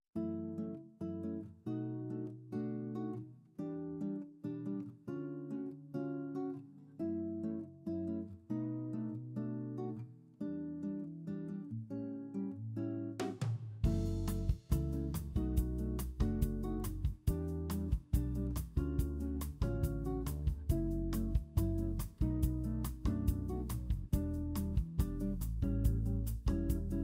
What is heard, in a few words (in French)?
fond sonore jazzy loop; BYmyCAR